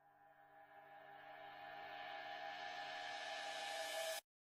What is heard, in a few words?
riser; undertale